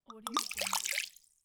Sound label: water bottle spill